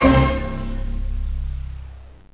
Orchestral Chimes

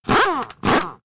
Pit Crew Race Car

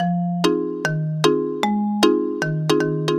Marimba Fm7 Jazz 75

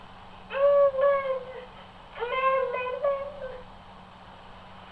Baby Monitor Sound

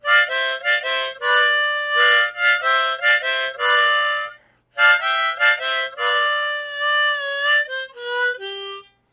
Harmonica

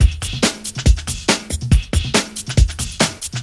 Jumpy Break 140

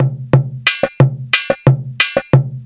Nagara 90

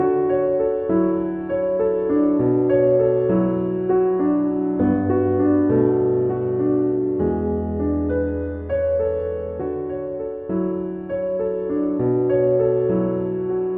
Pop Piano 100

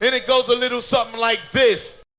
Hip Hop Vocal

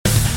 Metal Drum Loop

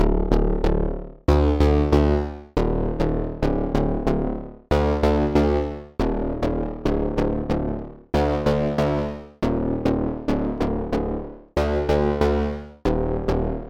Nu School Bass

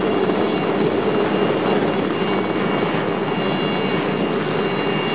Helicopter Hovering

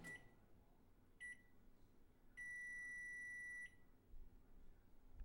Microwave Alarm Buzz

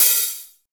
HiHat

